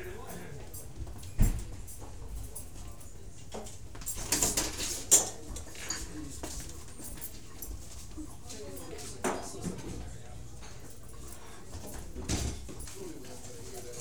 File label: Public BAR Quiet Crowd With Glas